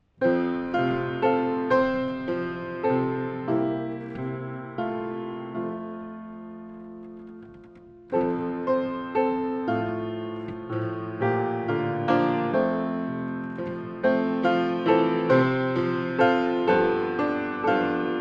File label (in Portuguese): playback hino